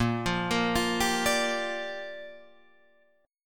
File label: A sharp 6th, Guitar Drop D